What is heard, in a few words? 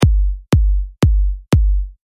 Kick comp; Компрессия. Основы компрессии звука